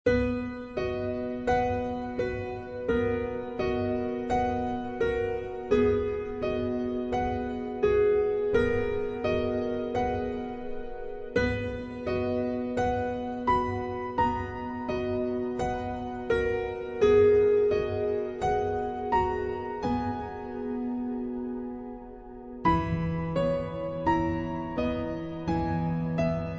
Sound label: Alarm Overcast Background, 天气动态闹钟dynamic alarm; Xiaomi MIUI 12.5 China; 阴天Overcast; MIUI12.5系统音效全收录